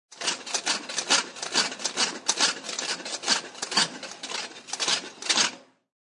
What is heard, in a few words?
Food Mill; Cook works a grinds food mill; Food Prep; Restaurants; SFXsource